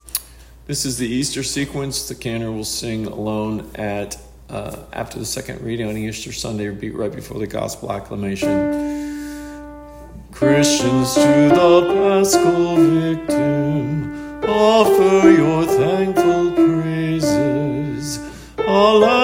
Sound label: Easter Sequence